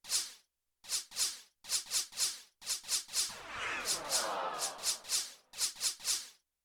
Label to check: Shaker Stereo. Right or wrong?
Right